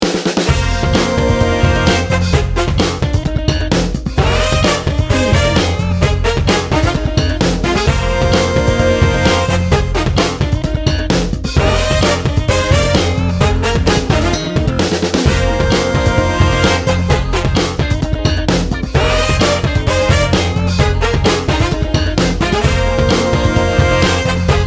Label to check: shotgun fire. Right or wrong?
Wrong